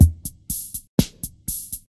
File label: 122 bpm eighties drum beat e key, kick tuned to the E note